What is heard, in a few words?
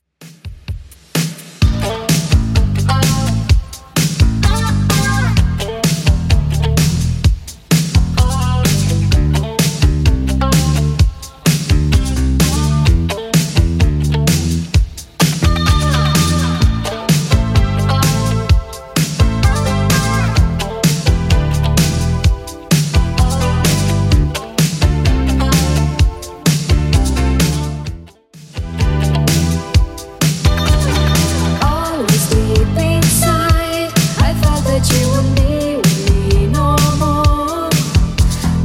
80s Redrum 128bpm